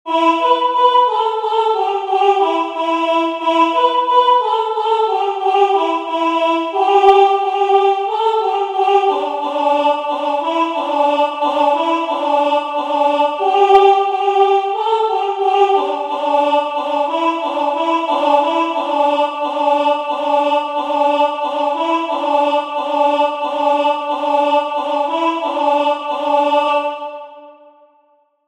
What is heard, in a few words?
song caddo; The Ghost-dance religion and the Sioux outbreak of 1890